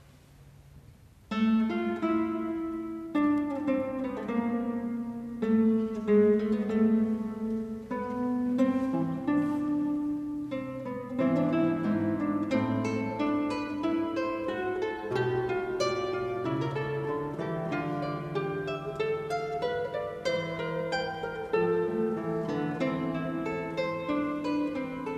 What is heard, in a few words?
Guitar, Bach Kunst der Fuge Canon per Augmentationem; Stephan Stiens Guitar Company